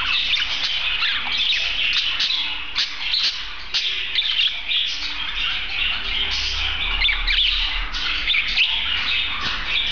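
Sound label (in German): wohlfuehlgesang weibchen, wellensittich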